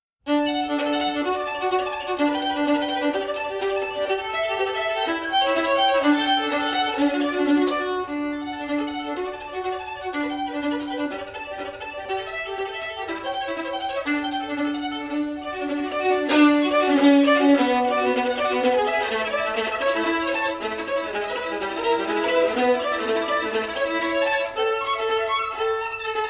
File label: Caprice (Classical); The Sims 4